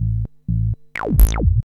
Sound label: B-LOOP